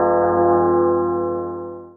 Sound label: attack result fail